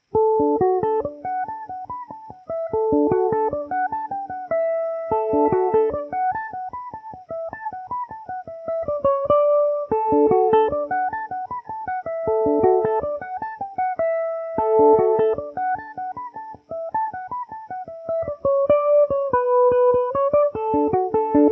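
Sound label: D major revelation, irish mandolin; The Rendezvous; Revelation “Jaguar” electric mandolin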